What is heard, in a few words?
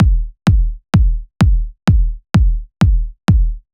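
Drum Loop 128BPM bass drum; VEC5 Drumloops; VEC5 Multi Loops; Loop Elements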